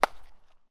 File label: sport baseball hit; martinimeniscus